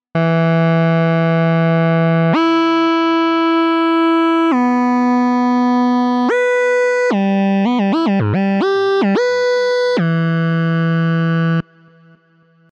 portamento fast

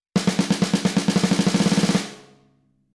round robin

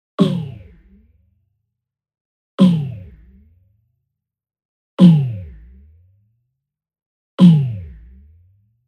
BlackHole picth

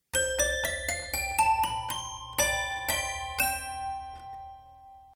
Toy Piano Classic

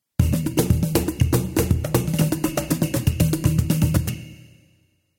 Cajon Ensemble

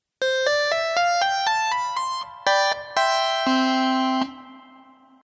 Electric Harpsichord

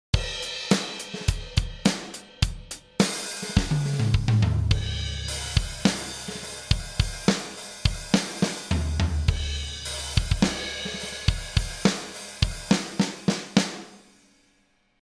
Half Time

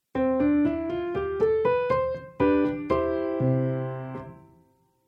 Wing Upright

